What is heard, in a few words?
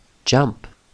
jump